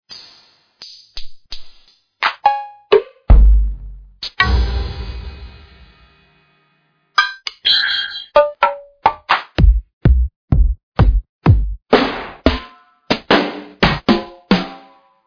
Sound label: studio drums